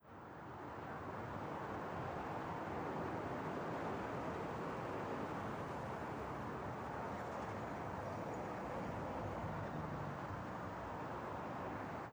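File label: Ayers Traffic left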